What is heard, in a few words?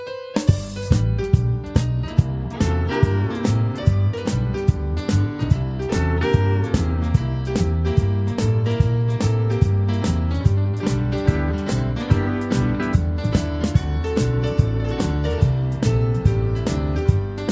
San Antonio Blues